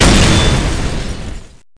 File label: explode rocket